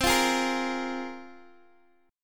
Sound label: C 7th Suspended 4th Sharp 5th, C, down, Guitar, Open G